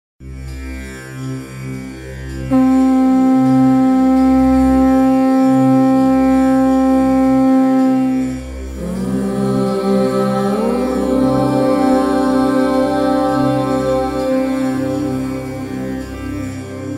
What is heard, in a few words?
om with shankh